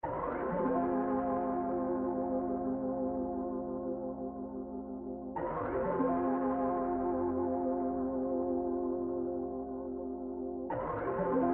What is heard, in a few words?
Latin Melody Loop F min 90 BPM String